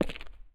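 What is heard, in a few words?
gritty cement run, footsteps; chief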